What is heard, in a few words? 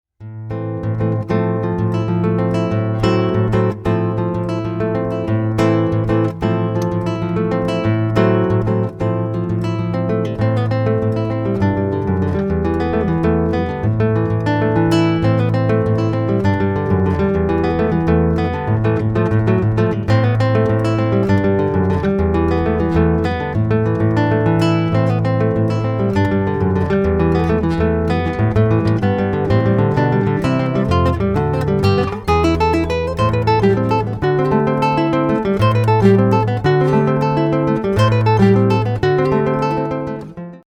Solo Musicians